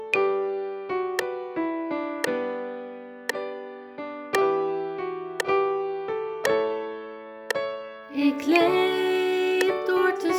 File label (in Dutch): Click Track Alt partij; voor het laatste refrein